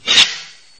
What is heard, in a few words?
Sword